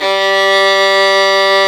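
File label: STR VLN, violin; AMG - Now CD-ROM (Roland); Art of Samplng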